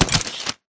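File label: Tick Movement Footstep Jump Land; Titanfall 2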